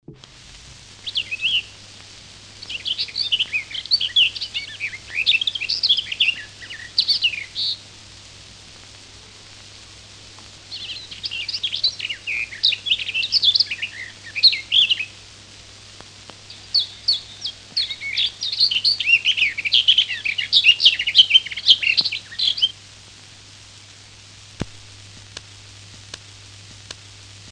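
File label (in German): Gartengrasmücke; Vogel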